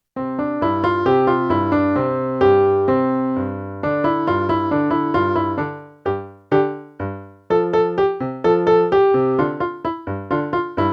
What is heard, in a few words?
Two Eighth Notes